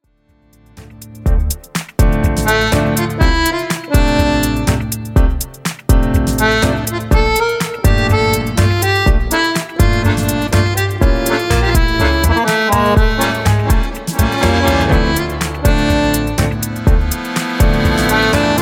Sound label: Tango